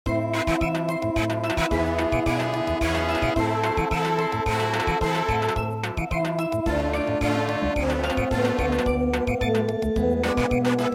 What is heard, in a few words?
Samba